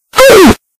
roblox death sound loud; Memes

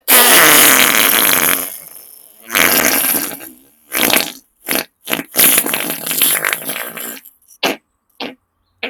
FARTS; memes

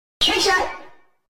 trick shot; bluudude; roblox

